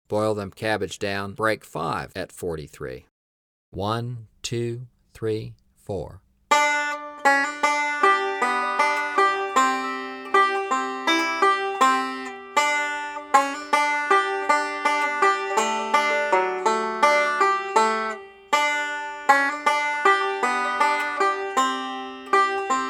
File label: 43 Bpm; Boil Them Cabbage Down Break 2